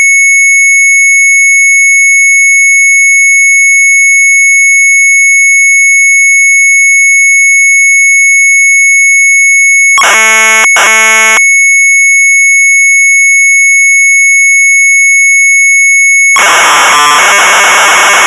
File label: Sharp MZ800 Tape